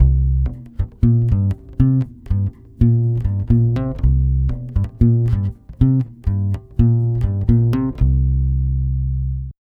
120 C7.9, Brazil Bossa; USB Soundscan; AKAI

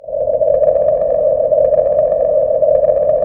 FX Dance

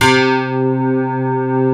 pick piano; East West - Global Sounds; S.I KEYS

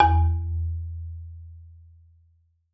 SOFT E1, Marimba; Sampleheads; Dave Samuels Marimba & Vibes

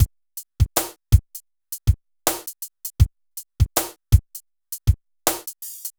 Synth Loop, BPM 80

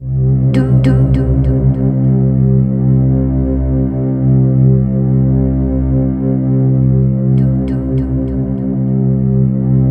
C4, Synth Leads

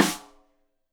Rim Shot Stereo; Deeply Sampled Drums; SMDrum

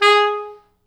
G#3, Pop Brass

